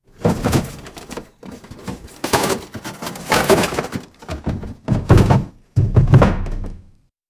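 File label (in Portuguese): faça um efeito sonoro de, truck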